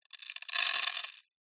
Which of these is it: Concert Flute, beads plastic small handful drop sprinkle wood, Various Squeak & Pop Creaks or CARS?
beads plastic small handful drop sprinkle wood